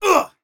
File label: grunt